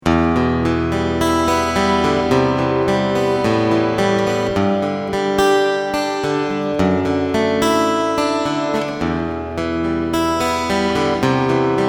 ENGL A101 Hall

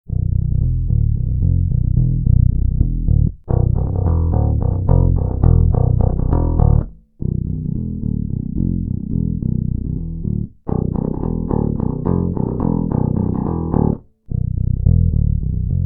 Spector

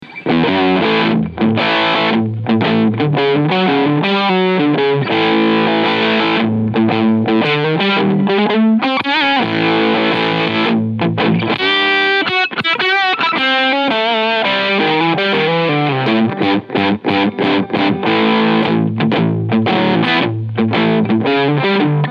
JHS PG 14 drive medium push full mid; 15 uhr mid freq 11 uhr tone 12 uhr

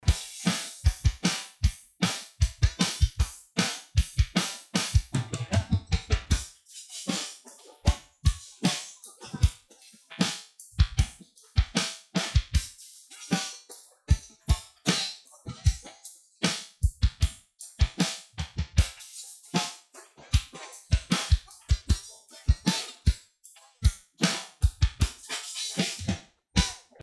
Magix Acid Pro 10 Stem Drums Strict

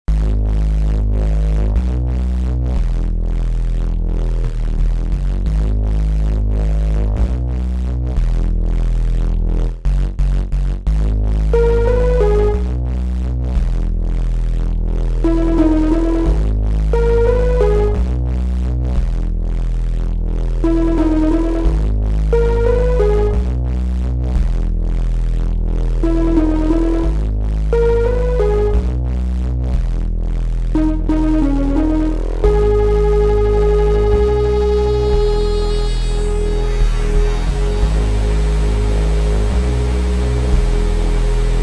bass; Elektron Digitone; Possbass

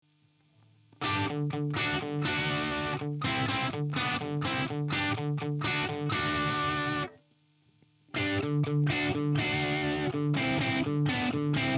Fender AmPro pushpull DIST

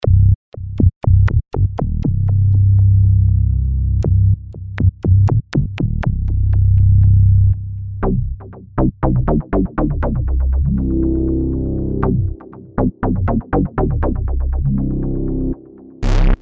Bass

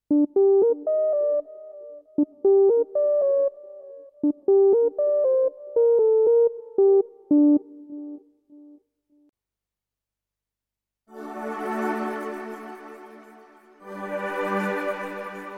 Yamaha P S500 Synthesizer

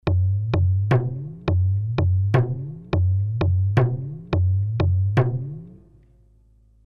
Mooer Synth Drum Patch Talking Drum

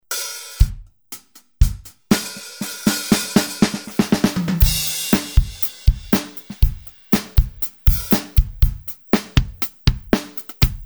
Multi Envelope Shaper

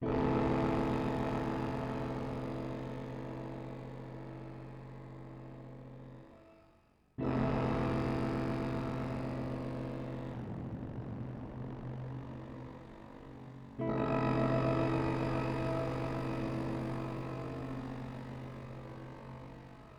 Meris Polymoon Modulation Both

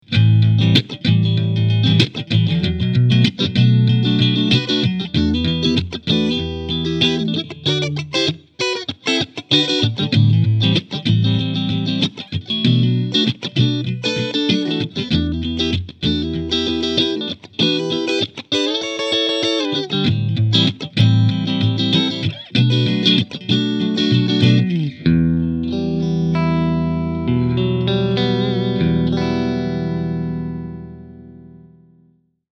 Cleansound Strumming; Behringer HA 40R

